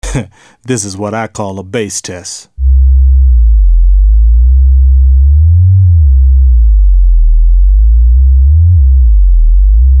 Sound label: bass test